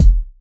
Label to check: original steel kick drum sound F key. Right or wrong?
Right